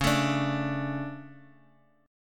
D 5th C sharp; D5/C#; strummed; JGuitar

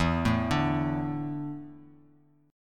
E 7th Suspended 4th; E7sus4 arpeggiated; x,7,7,7; JGuitar

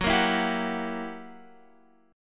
F sharp 7th Flat 9th Guitar Open F, chord; F#7b9 strummed

